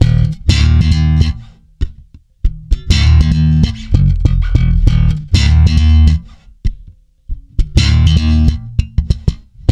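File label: D#, funk swing, bass; Spectrasonics; Bass Legends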